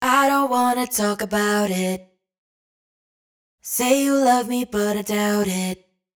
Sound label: hook Talk About It 128 F#m wet, Vocal; Slate Digital Omnivox Vocal Sample Pack